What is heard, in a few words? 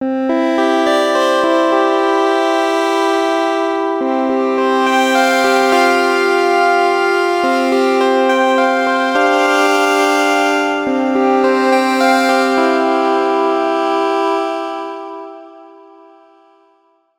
Oberheim OB-X Bell Like; GForce